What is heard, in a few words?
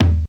DP50 Tom, Technics PCM DP50